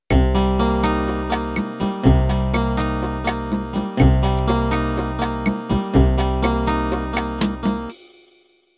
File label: Latin Unplugged Intro